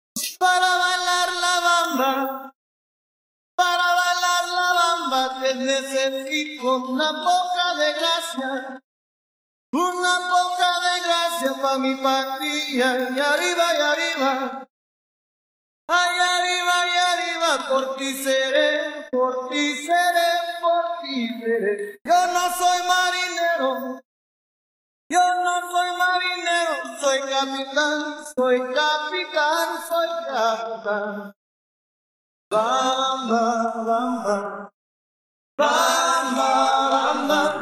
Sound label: Acapella